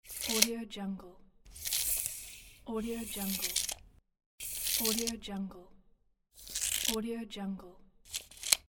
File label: tape measure